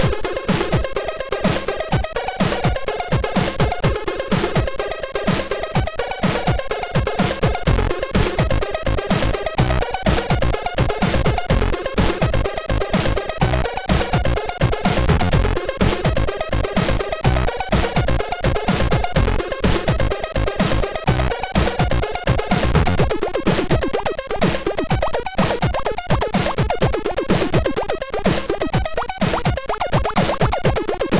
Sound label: House, sid MOS6581R3